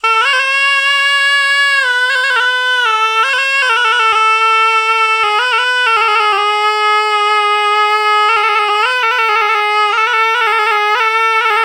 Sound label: Shenai, Wind Instruments; Sonic Foundry (Sony Creative Software); World Pop